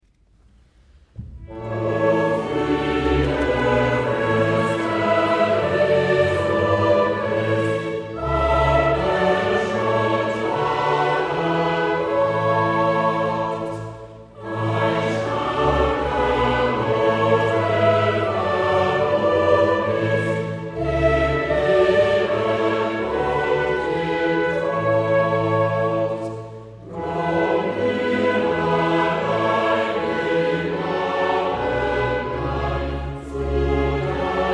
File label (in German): LIVE Choral; BWV 67; Du Friedefuerst; Kantatenkonzert